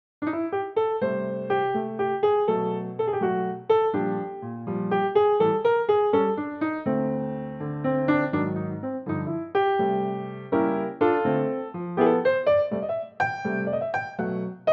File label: piano C; key - C - vocal range - E to C; Pianotrak; film "The Colour Purple"